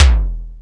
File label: DRM, YAMAHA R5+RX; Best Service ProSamples vol.55 - Retro Sampler; AKAI